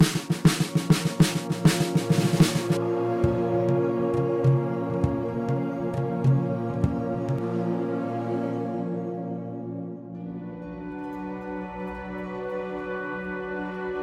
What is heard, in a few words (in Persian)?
نوای محرم, سمپل مذهبی و محرمی, ریتم مذهبی; demo navaye moharram